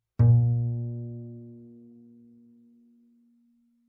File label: Bass pizz ff sul G Bb2, Double Bass; MIS Pitches - 2014; Strings